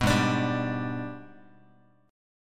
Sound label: Ab Minor Major 7th Flat 5th, down, Guitar; AbmM7b5; strummed